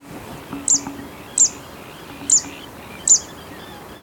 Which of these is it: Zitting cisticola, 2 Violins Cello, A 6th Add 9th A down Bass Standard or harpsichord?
Zitting cisticola